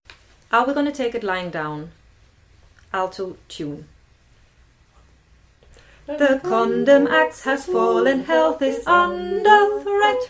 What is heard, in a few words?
Are We Gonna Take It Lying Down alto